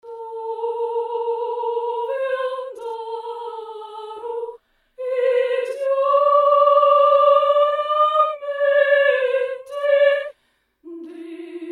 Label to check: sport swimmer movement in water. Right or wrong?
Wrong